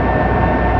fan loop

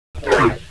pit drone attack spike; svencoop; sectore

